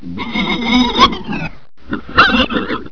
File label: boar death; Giant Boar